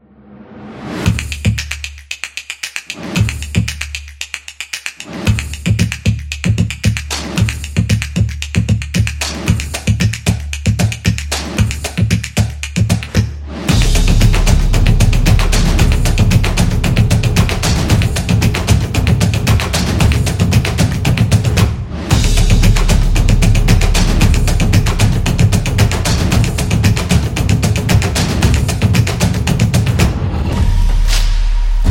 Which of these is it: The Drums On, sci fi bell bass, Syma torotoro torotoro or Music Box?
The Drums On